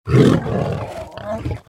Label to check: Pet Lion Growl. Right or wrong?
Right